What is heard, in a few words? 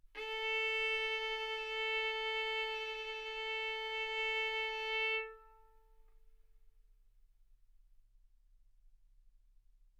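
Violin arco mf A string A4 B4 stereo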